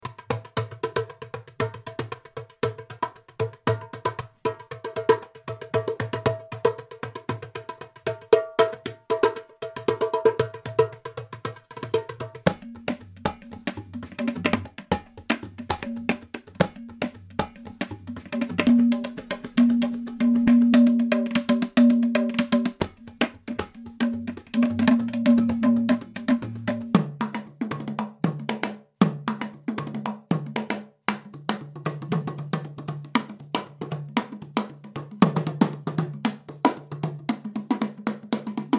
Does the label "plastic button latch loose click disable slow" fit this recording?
No